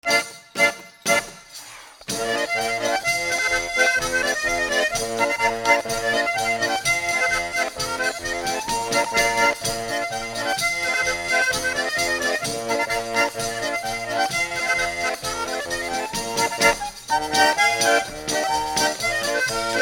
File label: Polka